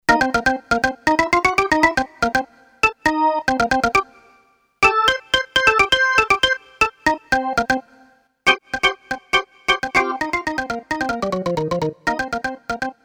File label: supercorgan, roland jd800; synth roland jd800; card demo